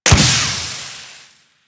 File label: Pistol Projectile Explode; Weapons; Warframe; Tenno; Guandao